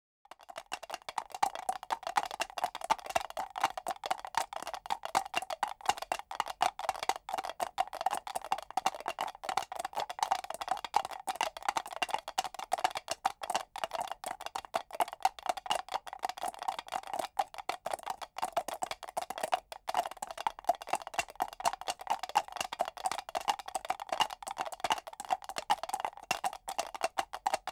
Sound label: Animals, Many Horses Trotting; BBC Sound Effects Library